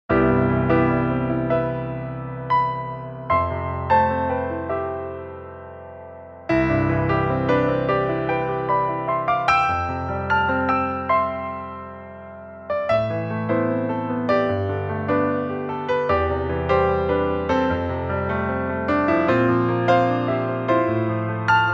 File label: Classical